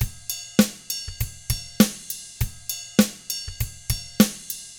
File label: ROCK, Drums; ILIO; Double Platinum Drums 1